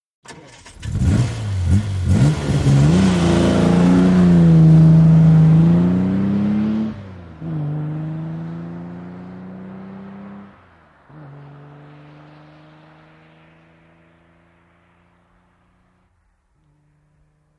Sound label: VEHICLES ASTON MARTIN: EXT: Start, depart, fierce